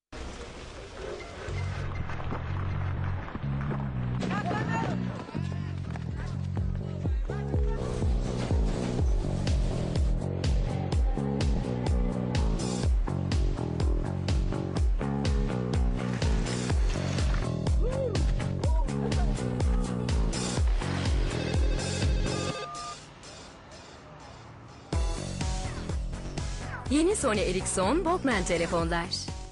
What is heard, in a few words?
walkman, Famous TV Ads Ringtones